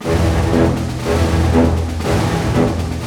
SNAR, Snare Army; East West - Scoring Tools

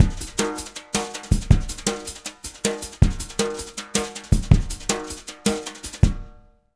160 Jungle, Jazz Latin Drumloops; USB Soundscan vol.08; AKAI; 90s sample CD

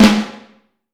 5X14 Snare Drum; Q Up Arts - Johnny.C's Vintage Snare Drum

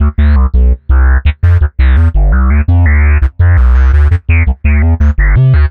Synth Bass

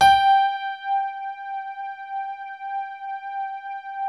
BALLAD G4, E-PIANO; Music Store - Claudius Diemeter Schwerlos

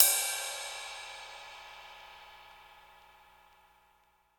RIDE HIG, Drum Kits

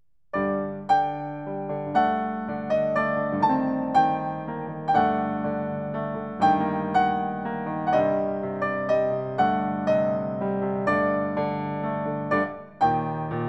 Oriental Song; Fourth Way

